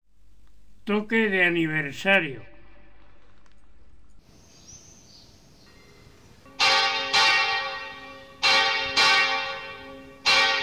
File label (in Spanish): Toque de Aniversario, Campanas